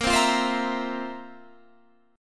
F Major 7th Suspended 4th Bb

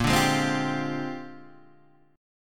A sharp 13th, Guitar DADGAD

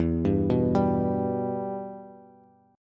E-Minor, Bass; arpeggiated; JGuitar

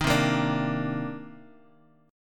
C Minor 9th, Bass Standard; Cm9 strummed; JGuitar

